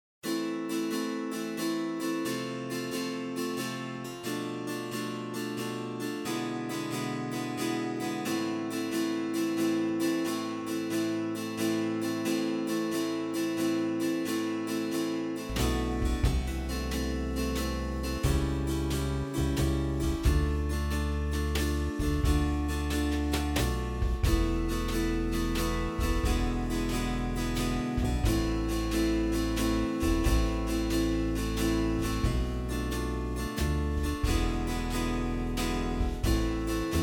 guitar, virginal; J Salodiensis

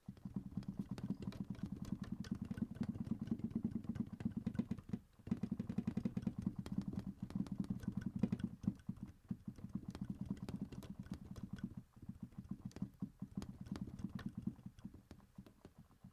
pedal sound test

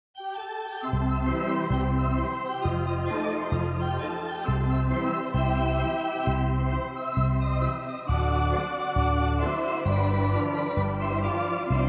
Hammond; OrganHammondTeq; Organteq

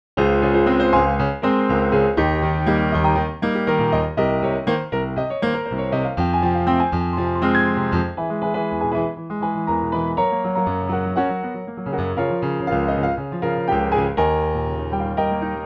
NY Steinway D Player; PianoTeq 9 Default

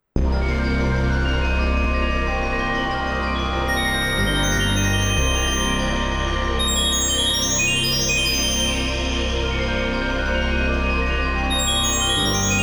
Celesta Forest

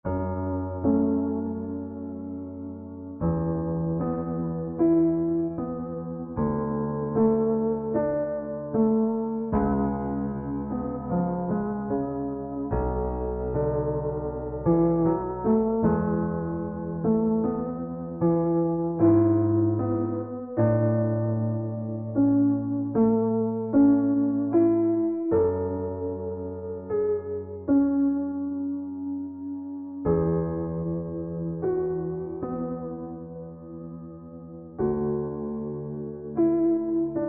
Steinway D Prelude fx

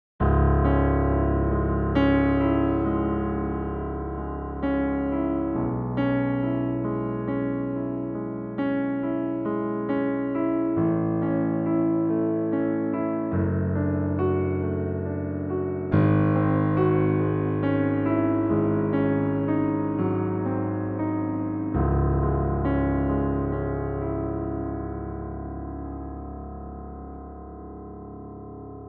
Piano

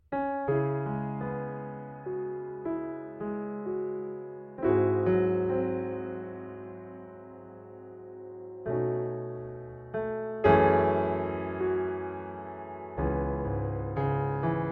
D4 Grand

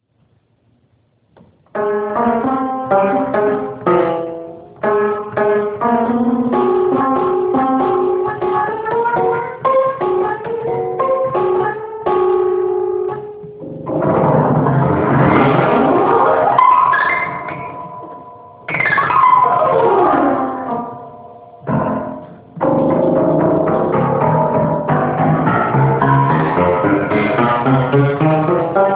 Pleyel

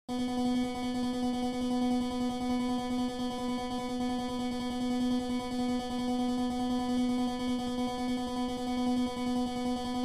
repetition comparison Harpsichord

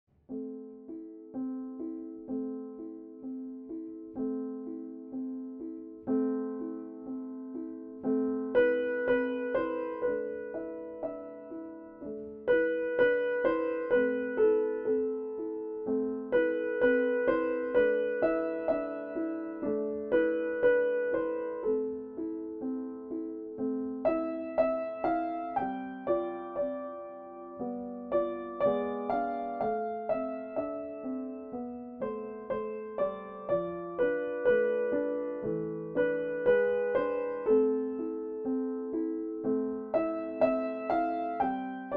Couppey Streicher New Mic

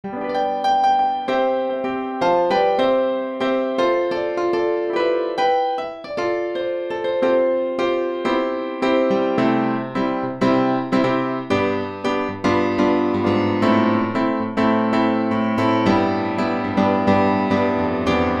rock

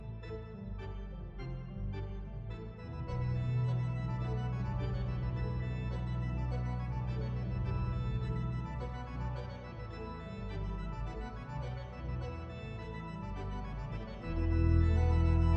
Organteq Tubular Bells; Organteq1.04; Ptq6; Reaper

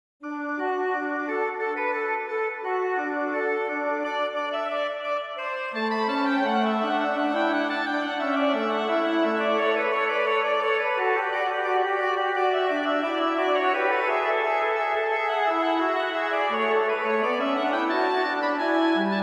Duo for trumpet; Dandrieu

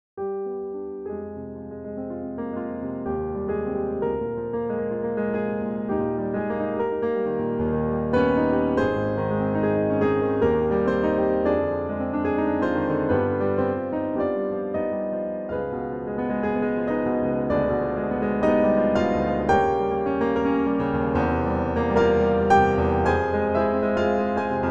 C3 Close Mic

